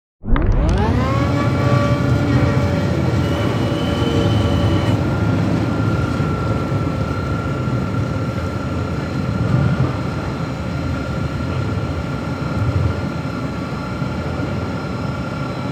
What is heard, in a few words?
fieldrecording ferry engine noise; weeklybeats-2020